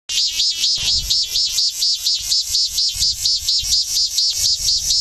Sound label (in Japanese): minmin zemi fast, cry of insects